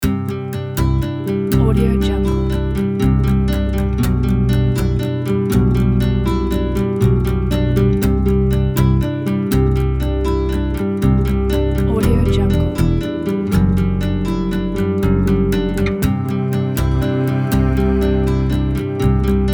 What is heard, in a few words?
reflective acoustic guitar